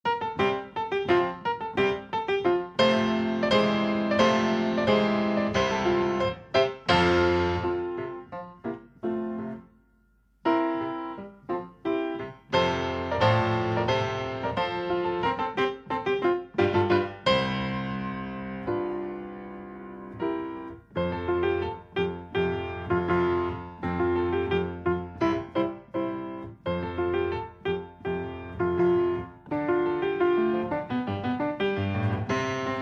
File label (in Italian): basi pianistiche